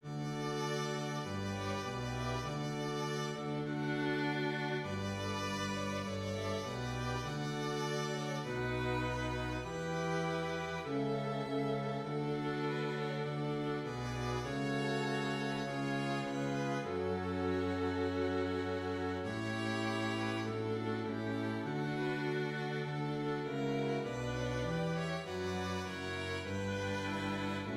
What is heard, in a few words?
Strings; God the Omnipotent (Russia)